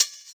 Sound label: Hhat, Hihat; Equipped Music; Loungin House